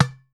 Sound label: skinned udu